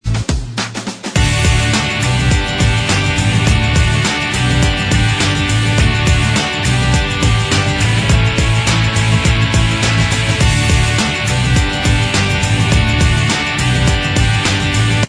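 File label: instrumental track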